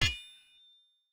Sword Clang; soundscrate

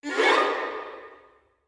short gliss up

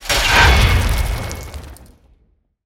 metallic crash; soundscrate

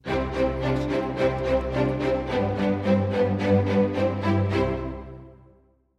Friendly Ident Strings; SoundsCrate

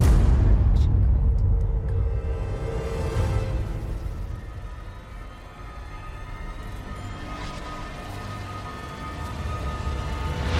trailer riser horror; soundscrate

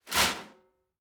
Metal Footfall; soundscrate

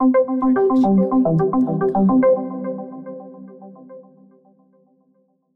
Friendly Synth Ident; SoundsCrate

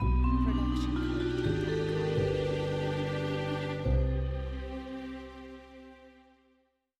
Mystery Ident; soundscrate

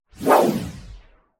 Text Message Woosh; SoundsCrate

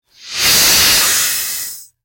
snake hiss

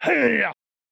man attack heavy; soundscrate

